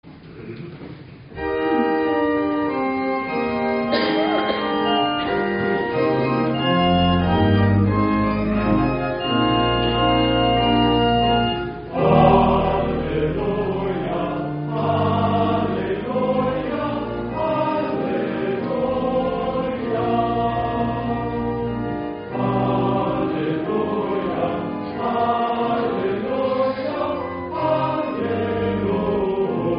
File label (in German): Halleluja Zwischengesang, Gesangverein